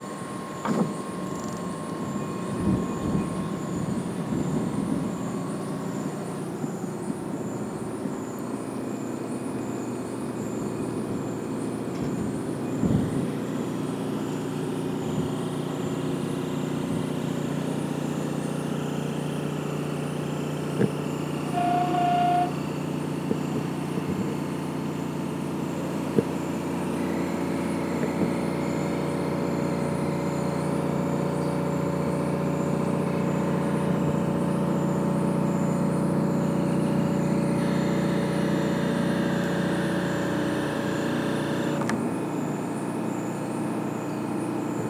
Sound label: Paranal Auxiliary Telescopes (ATs) dome closing; soundscape